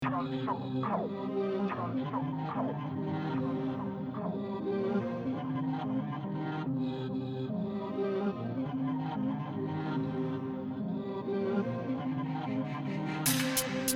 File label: Ocean 145BPM Cmin tagged